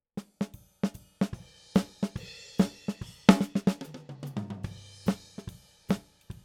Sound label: SNR top